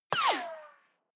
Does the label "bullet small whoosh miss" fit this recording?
Yes